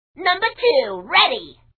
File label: sound ready; operation graduates